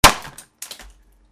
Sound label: RIPPING